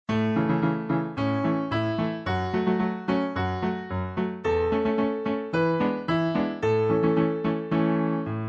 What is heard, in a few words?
instr; Anchors Aweigh